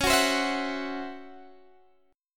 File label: Db Minor Major 7th Double Flat 5th Guitar DADGAD